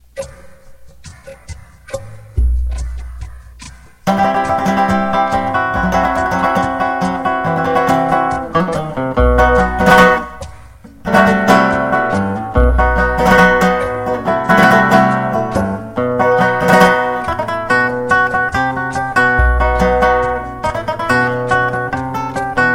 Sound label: Tangos